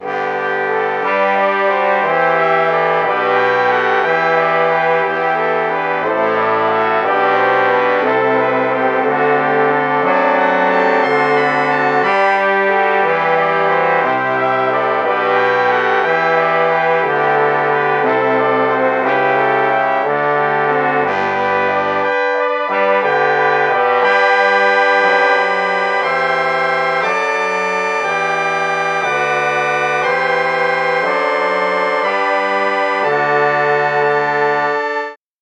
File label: trombone